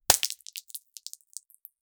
sticky slime explodes

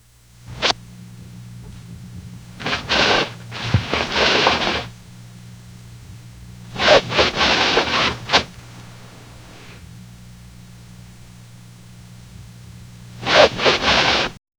whoosh; abstract

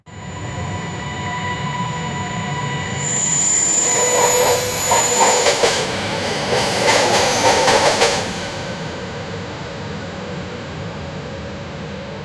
the acceleration of the e, electric train

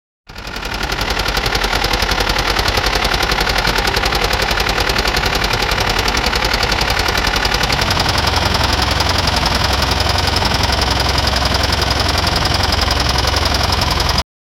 create the sound of a, agriculture

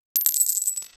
an epic and majestic sound, treasure

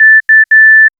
the ping of the sensor

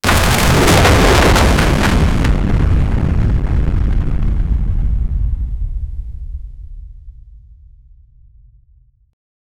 the sound of twin towers, catastrophe

